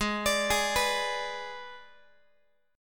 G sharp Diminished G sharp, down, Guitar; arpeggiated; 4A,4E,5A,5D,5G#,6C#

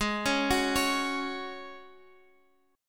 Ab 7th Suspended 4th

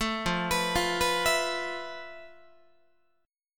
F Major Sharp 11th, Guitar; arpeggiated

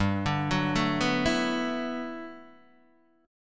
G Major 13th, Guitar DADGAD

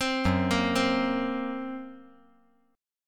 F sharp Major Flat 5th; F#Mb5 arpeggiated

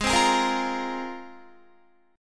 Ab 6th Add 9th, Guitar; strummed

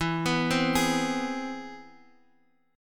C Augmented Major 7th E

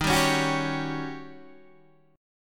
D sharp 7th Sharp 9th

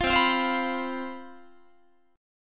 C Major 7th Suspended 4th, C, down, Guitar

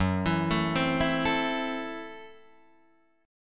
Gb Minor 7th Flat 5th, Guitar Drop D

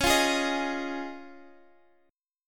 C sharp Major Add 9th; strummed; chord; x,x,11,10,9,11; JGuitar

